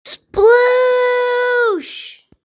splooosh

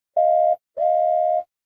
whistle

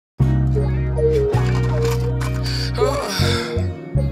ughh

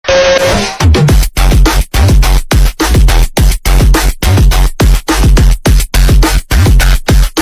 dubstep

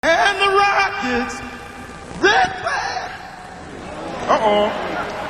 anthem fail

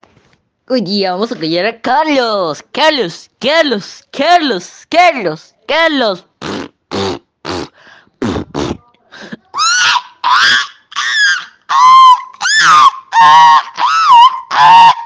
phonk

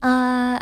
uuh

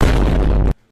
vine boom; triggered

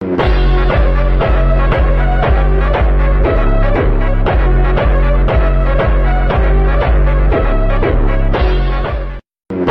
tuff music

